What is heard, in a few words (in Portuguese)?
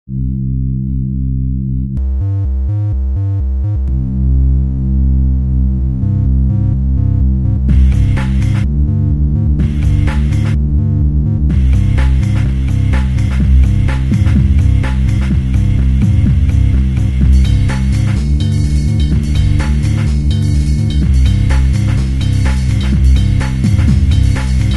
so o beat e baixo